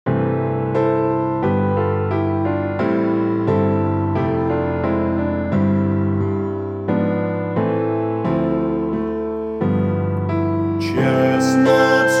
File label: Christmas Song Vocal; mayors christmas carol music